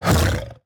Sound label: Night Elf Huntress Underlay Attack